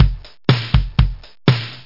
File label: BEAT; r5-drums